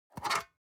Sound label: object metal shell storage lid slide open medium